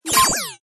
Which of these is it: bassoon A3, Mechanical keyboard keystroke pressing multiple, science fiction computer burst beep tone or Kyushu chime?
science fiction computer burst beep tone